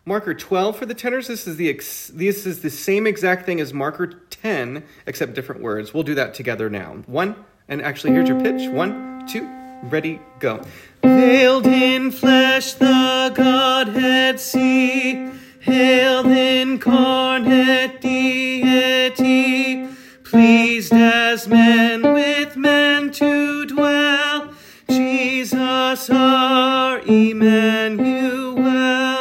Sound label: Tenor, choir